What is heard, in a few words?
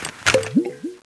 water cannon draw, weapons; zmall